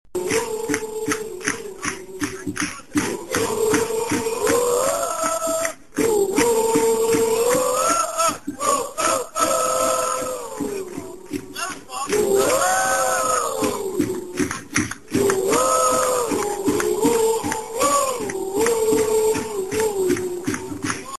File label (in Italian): cori; brasil